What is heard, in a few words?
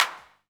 CLAP, Dance Drums